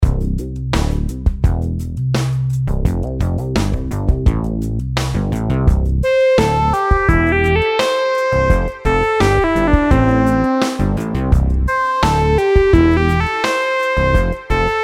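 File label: melodies simple 8s triplets C major; melody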